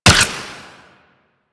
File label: Flare Launch; DE; Warframe; Weapons; Archwing; Powers